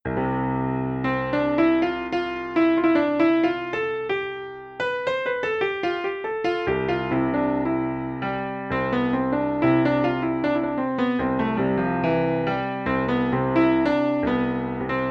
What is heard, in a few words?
Short Improvisation in C Ionian